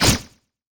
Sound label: STAFF FINAL SPEAR; skill; FlyForInsanity